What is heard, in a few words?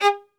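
solo violin, strings; ILIO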